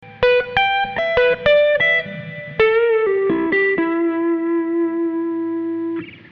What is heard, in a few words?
lick, 12bar